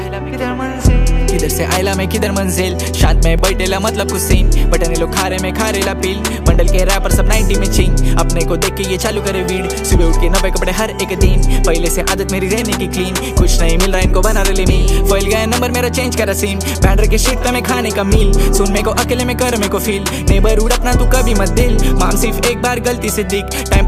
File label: Hip Hop